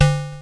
Crushed Perc, bitcrushed; musicradar

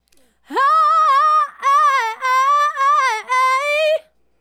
Female Solo, Hip-Hop and RnB Vocals

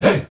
HEY HEY; Heart of Africa; Spectrasonics; Heart of Africa 1; CH. SHOUT2

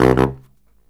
Killer Brass Riffs

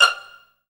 STR Pizz 3 F 5, STRING; Best Service Gigapack 1; STRING 2

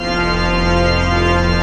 PIPE ORGAN

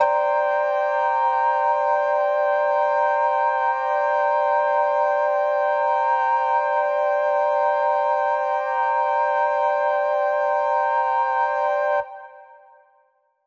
Mixed Winds C7:9, synth orchestra

